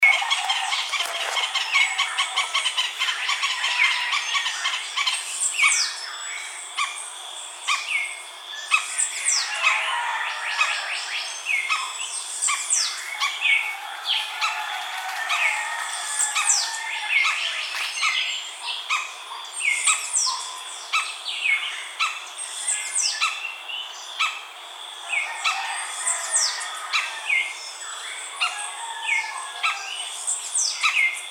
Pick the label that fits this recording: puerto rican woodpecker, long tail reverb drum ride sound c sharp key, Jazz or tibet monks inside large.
puerto rican woodpecker